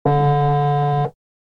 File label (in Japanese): スマホのバイブ音